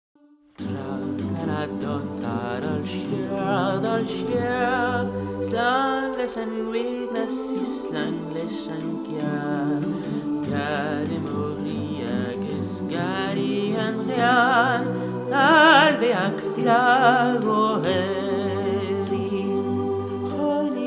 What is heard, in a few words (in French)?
sopranes; trasna na dtonnta